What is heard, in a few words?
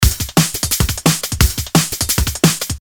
Breakbeat 174